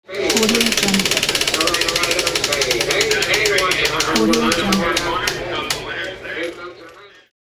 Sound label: casino wheel of fortune